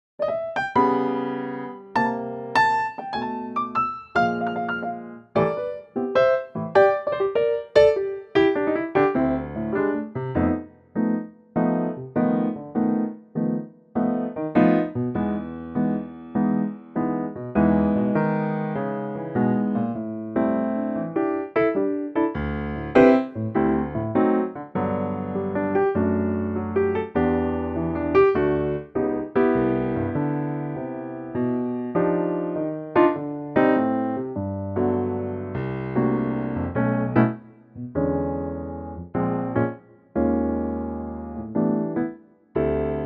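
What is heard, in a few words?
piano track G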